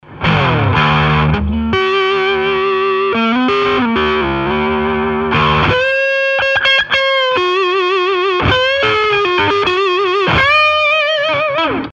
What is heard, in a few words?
Neck distortion, electric guitar; Fender Japan TL 43